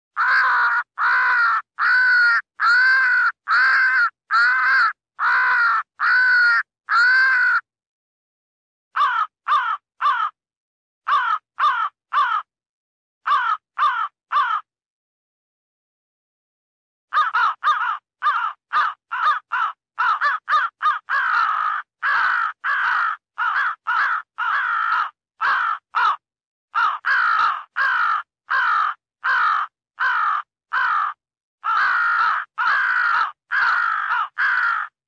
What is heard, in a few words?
Crow buster